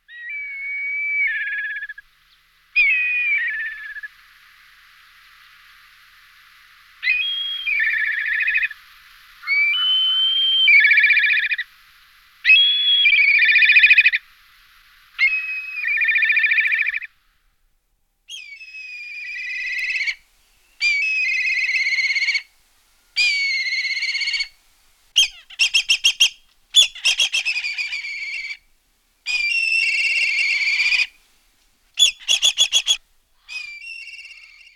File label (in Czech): Lunak hnedy Milvus migrans